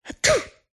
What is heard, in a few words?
human FEMALE sneeze